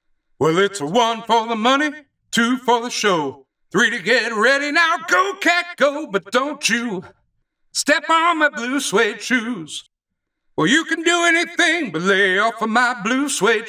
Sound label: singing waiter